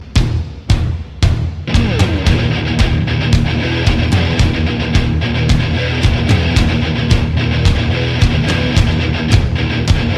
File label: rock guitar; ringtone